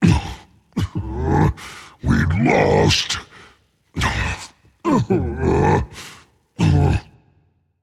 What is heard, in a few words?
boohoo rage; chaac